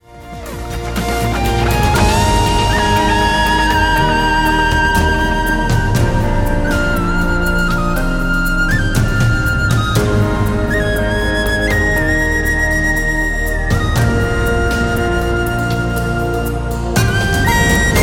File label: Inspiring Soaring Aerial; scoring